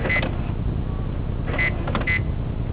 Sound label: siren new, alarm siren